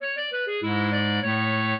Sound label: clarinet; Mozart